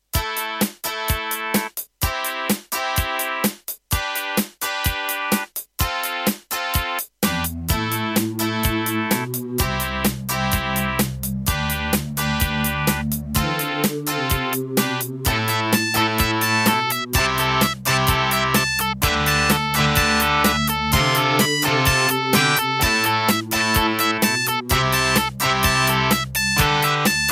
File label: Raptor - Wave 1, IBM Aptiva SW1C; raptor call of the shadows